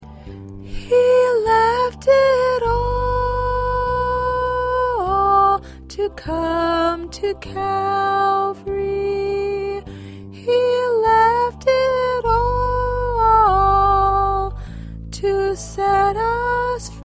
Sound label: Soprano